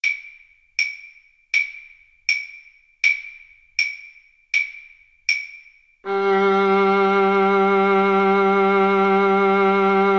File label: ave maria, Viola Cello Duet